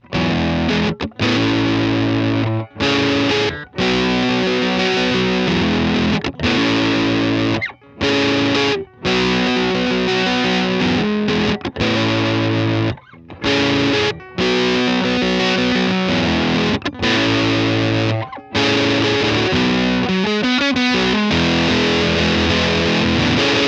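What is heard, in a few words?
Gemini IV Fuzz, Strat Pickups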